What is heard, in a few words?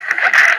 kick sound; socko